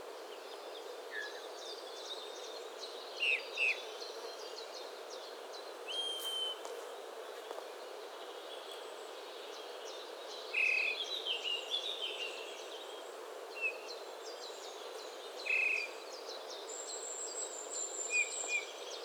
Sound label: Eurasian Bullfinch Pyrrhula pyrrhula